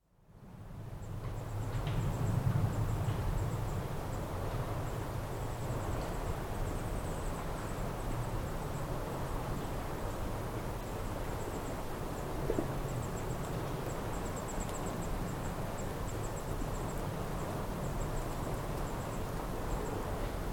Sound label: Very quiet lochside mixed woodland atmosphere in afternoon, waves lapping, occasional coal lit, wind in trees; Outdoor Sound Effects; BBC